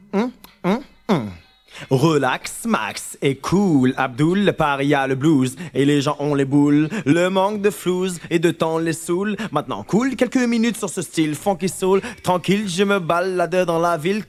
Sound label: A Capella 440